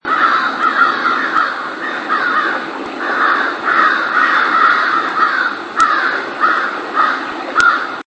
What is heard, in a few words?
Corvus brachyrhynchos call